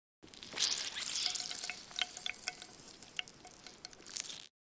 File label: Micro Sounds Squeezing Out The Juice; Tomas Herudek